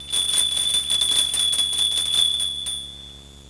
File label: bell